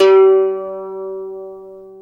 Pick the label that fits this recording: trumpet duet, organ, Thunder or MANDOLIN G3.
MANDOLIN G3